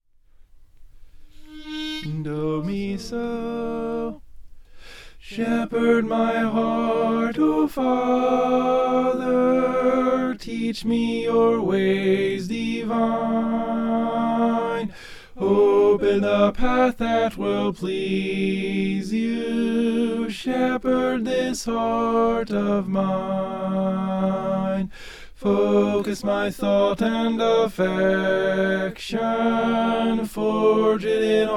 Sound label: tenor